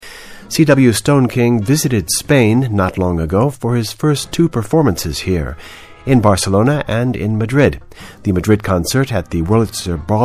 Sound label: Blues Band; new blues band's first visit to Spain